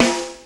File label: snare drum sound a sharp key